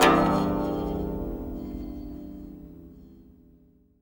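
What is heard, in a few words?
ZIMBAL PIZZI; Best Service; World Colours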